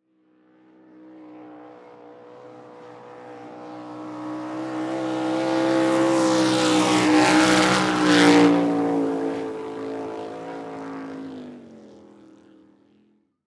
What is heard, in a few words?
Swamp Buggy Pass By Fast; Transportation Watercraft